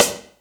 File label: Rimshot